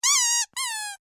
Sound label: Toy Squeaky Squeak Long, Strange Toys; Miscellaneous Toy